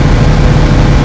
airflow; Mafie 1

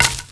bush; Mafie 1

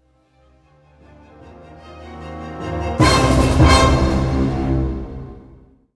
music; Mafie 1

